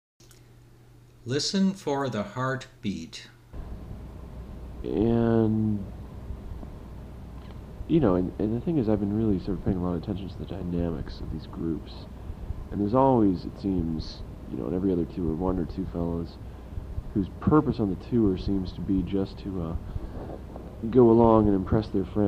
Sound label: Heart Beat